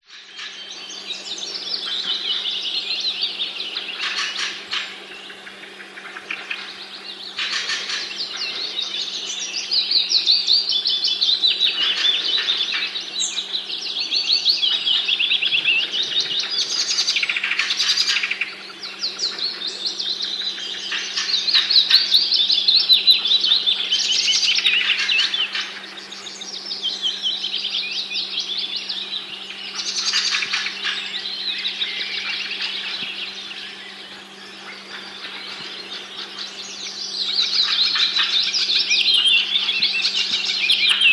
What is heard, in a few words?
Jungle; audio hero